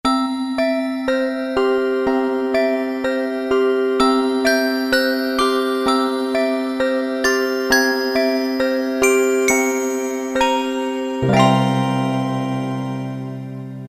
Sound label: Bells Harmony, Roland PN-D50-04